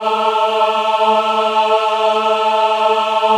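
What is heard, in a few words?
A3 men women ah, Choir; USB Soundscan vol.28; Acoustic & Synth; AKAI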